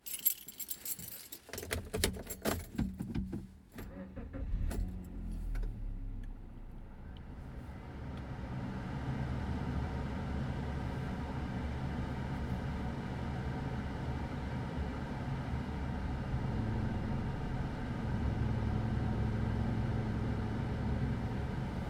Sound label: Car Starting